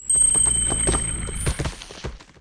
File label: Life tree; Seer; GamigoNA